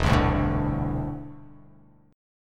E 7th Suspended 4th Sharp 5th

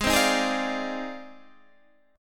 F Minor 13th, G sharp

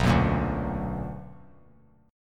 B Minor Major 7th; strummed; JGuitar

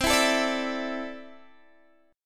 C 6th Add 9th, C, down, Guitar, Open G

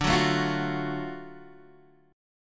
Gb Minor Major 7th Sharp 5th, guitar

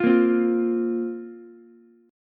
Bb Suspended 2nd Bb Ukulele; Bbsus2 strummed; 10,10,8,8; JGuitar

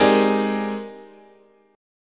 Ab Major Sharp 11th, guitar chord

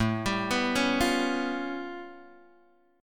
A Augmented 9th A Guitar Open G

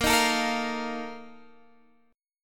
Bb Major 7th Suspended 4th, Guitar Standard; x,13,13,14,11,13; strummed

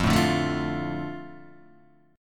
F 6th F down Guitar Open D

